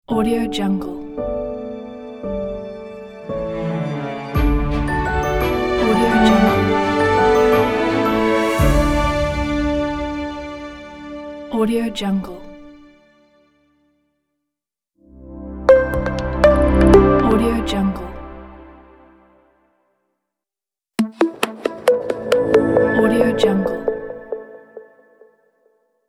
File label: inspirational positive logo music; inspirational positive logo pack 5